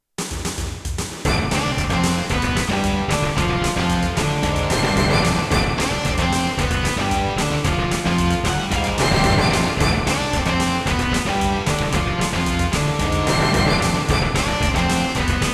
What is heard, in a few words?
Technodrome Elevator; TMNT 4